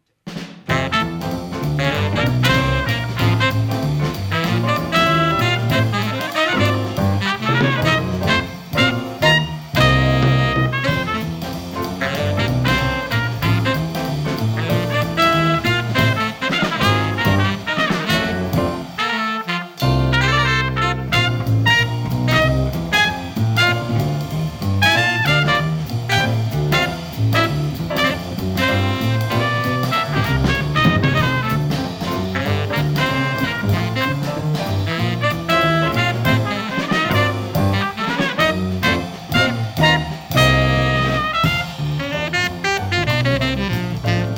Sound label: vintage mics Big Beat